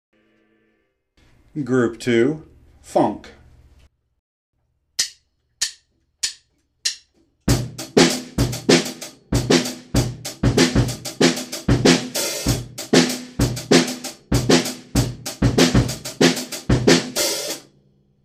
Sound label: funk